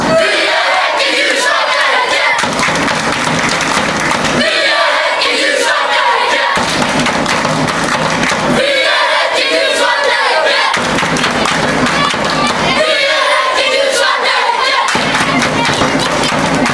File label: we are hacken, fanchants, sweden